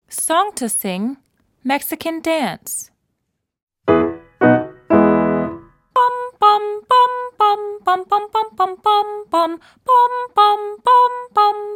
Mexican Dance Song to Sing

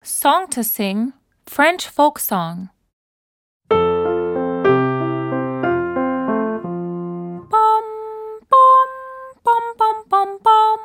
French Folk Song

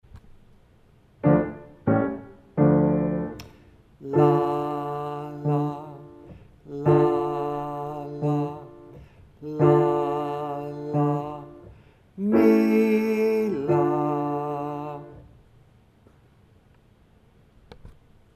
Latvian Folk Song

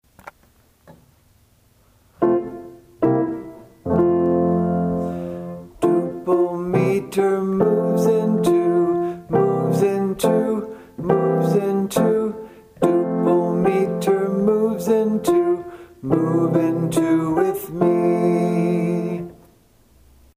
Duple Meter

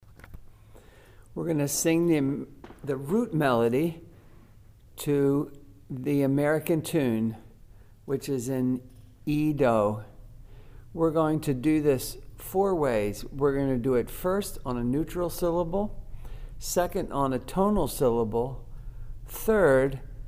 Root Melody

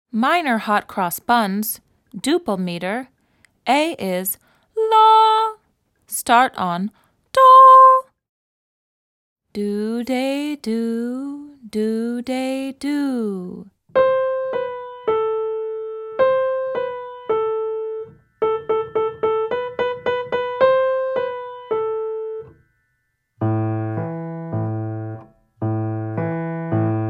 Minor Hot Cross Buns

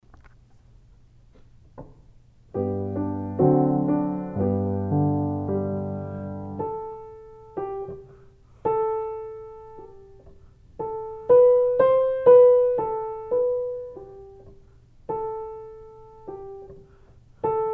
French Lullaby

